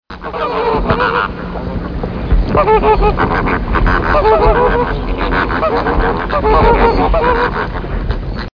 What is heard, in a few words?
Greater Flamingo, songs